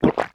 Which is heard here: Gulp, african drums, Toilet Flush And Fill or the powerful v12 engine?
Gulp